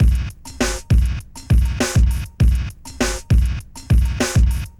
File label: Hard HipHop Drums; Pro Tools Essential Loops; Digidesign